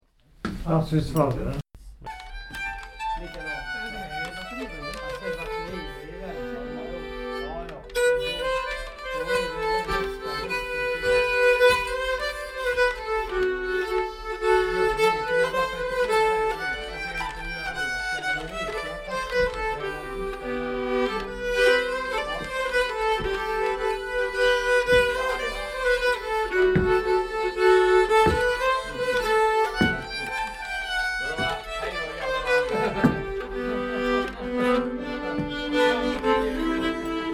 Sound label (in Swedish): Hambo G